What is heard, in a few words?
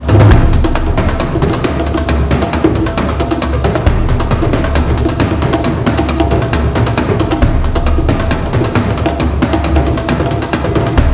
Dramatic Drums Chase; Toby: The Secret Mine